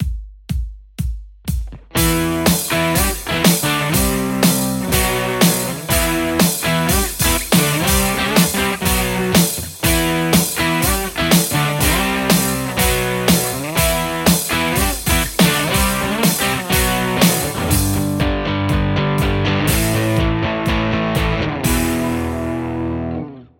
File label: strat; mercuriall harlequin